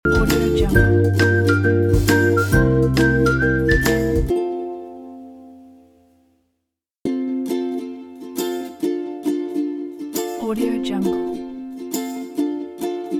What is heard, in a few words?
summer bliss